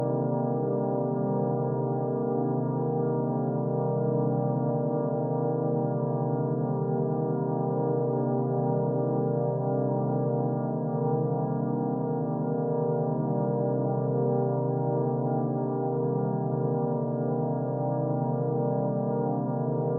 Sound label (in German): drone hoch